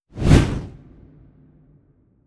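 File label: Fire Elemental Inferno swoosh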